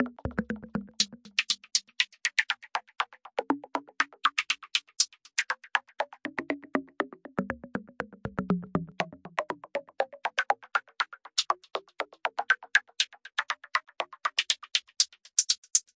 120 Percussion, 120BPM; Breathing Circle

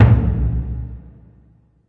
big burundi, African Burundi Drums; ETHNIC and WORLD PERCUSSION

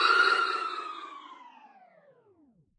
Cut Drop fx; drums; hip hop; STYLE KITS (Hip Hop, Etc); Khezie Hip Hop Kit

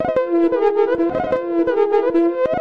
crazy making fm synth loop; LOOPING AMBIENCE

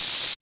electro perc; Hip Hop; STYLE KITS (Hip Hop, Etc); Precise Electro Percussion

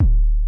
kick, drums single hits; iElectribe Kicks

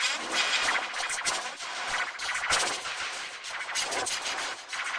underwater crash melody, 96 bpm; 091 to 100 bpm

